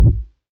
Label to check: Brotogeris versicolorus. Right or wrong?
Wrong